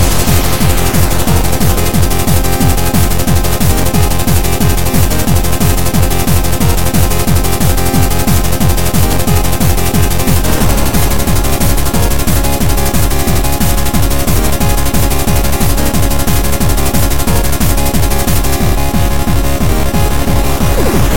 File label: 8 Bit Inferno